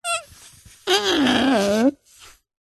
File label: whoopee cushion blow up inflate squeak